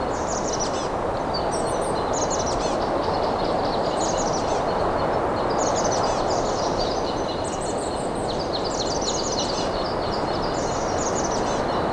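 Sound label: ambience spring valley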